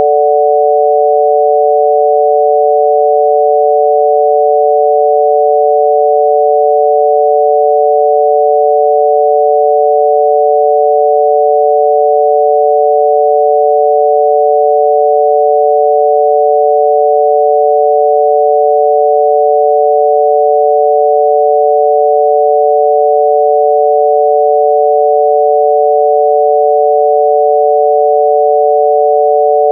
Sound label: chord D major